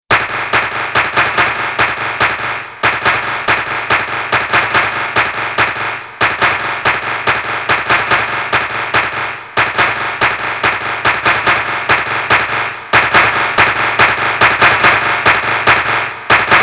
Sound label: DRUM